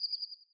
Night Cicada Fade

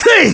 Attack; Ganondorf

